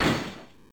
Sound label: Crash